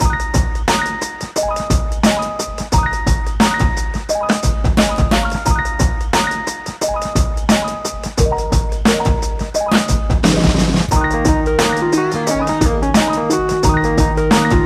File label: Urban Hip Hop